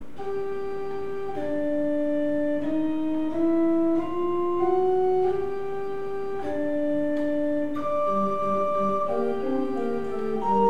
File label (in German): Fugenfragment g Moll unvollendet; mozart